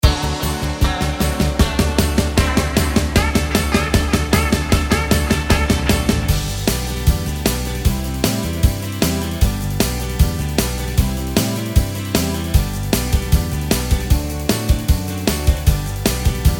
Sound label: (G) No Backing Vocals